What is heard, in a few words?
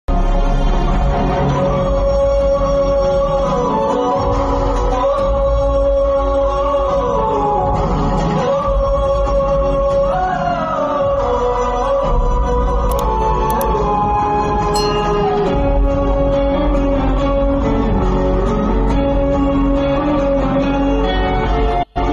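emotional background music ringtone; das ka dhamki movie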